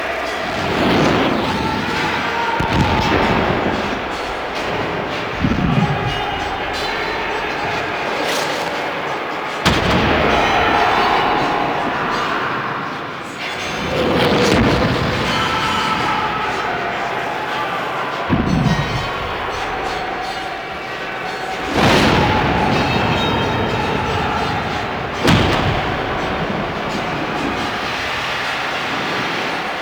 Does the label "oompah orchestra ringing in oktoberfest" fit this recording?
No